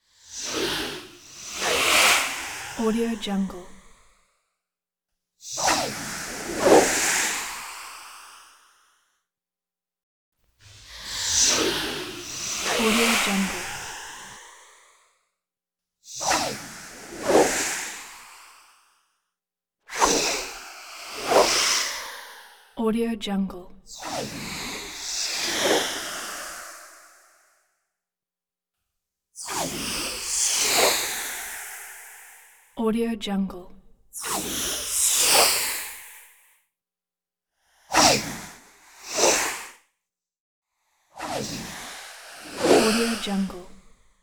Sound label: cloth whooshes sharp whooshes; transitions and movement